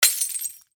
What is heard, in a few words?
light bulb smashed; sound ideas